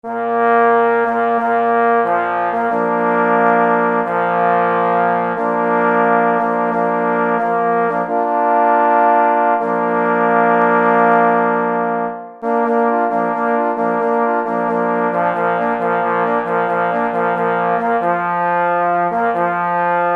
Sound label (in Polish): na 3 parforsy; Na chwale munsterlanderow; pies w muzyce lowieckiej